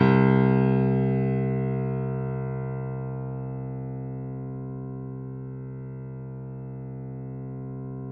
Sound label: piano; East West; The Ultimate Piano Collection